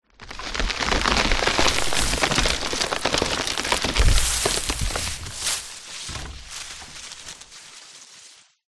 impacts tree fall down

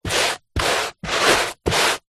shoe sneaker scuff carpet; foley; zapsplat

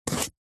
food turmeric or ginger raw single slice knife

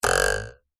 cartoon ruler twang metal

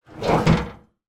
household oven grill door open

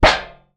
tin lid hit; foley; zapsplat

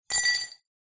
household cutlery items x2 set down into glass; zapsplat

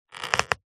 foley plastic squeak creak tighten turn; zapsplat

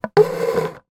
straw slide in to plastic lid drink cup; food drink; macdonalds

